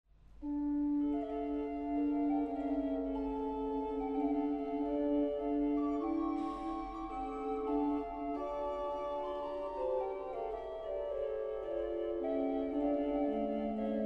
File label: IMPRO flutes